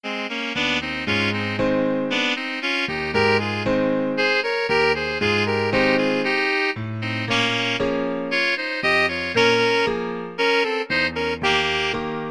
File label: alto saxophone duet